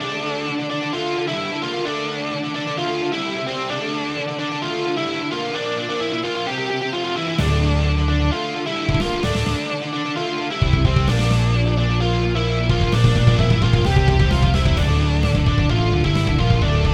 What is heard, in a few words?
theme song remake